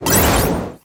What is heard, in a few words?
line whoosh money; Burning Reels; wazdan